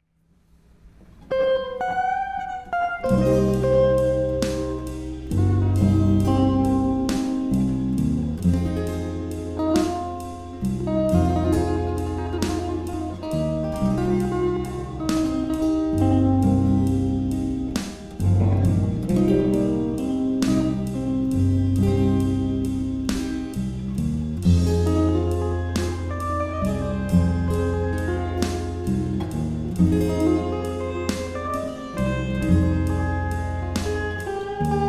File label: Rainy Jazz Blues